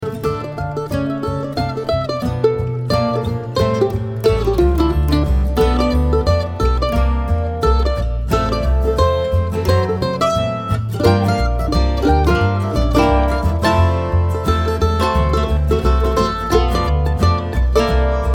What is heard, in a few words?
Mandolin Ensemble